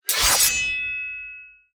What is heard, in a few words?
draw sword long